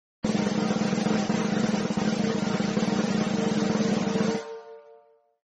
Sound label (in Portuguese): que rufem os tambores